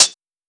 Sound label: Hihat Closed Squashed